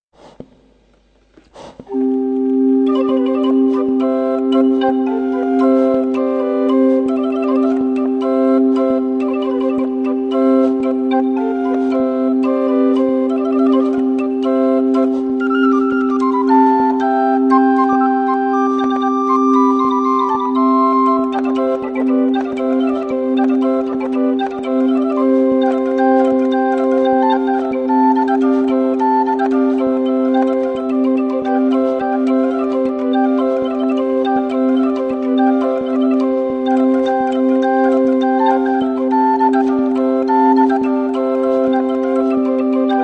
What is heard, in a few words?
intrada portativ; susato